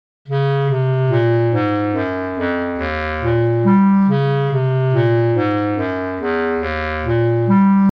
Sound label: bass clarinet passage; sampled woodwind instruments; Berlin Woodwinds; Orchestral Tools